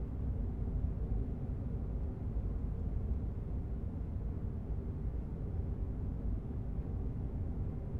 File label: Ambience Second Floor